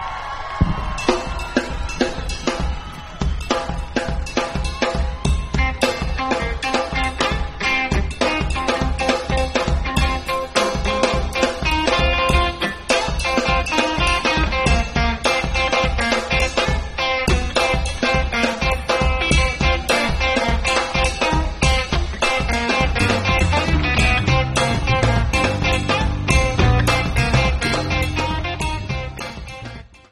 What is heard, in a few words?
glove jazz